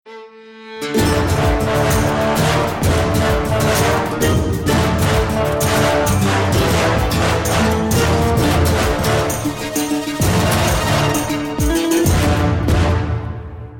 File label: start action